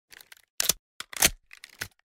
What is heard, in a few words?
deagle reload tactical; emx kronos